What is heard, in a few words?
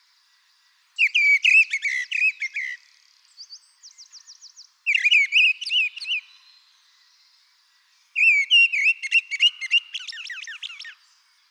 mistle thrush; andy holden auguries